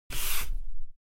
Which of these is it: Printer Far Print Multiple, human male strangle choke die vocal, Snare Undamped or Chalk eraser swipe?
Chalk eraser swipe